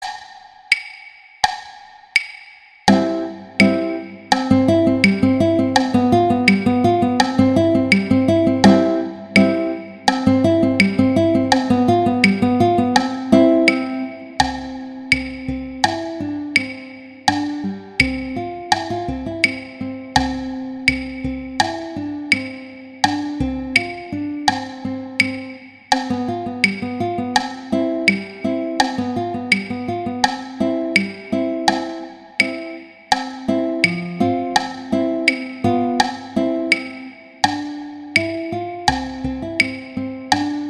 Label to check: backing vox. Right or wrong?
Wrong